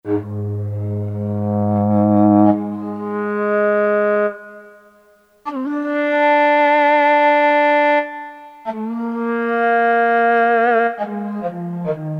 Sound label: Murafuki, Wind Instruments, Korg DSS-1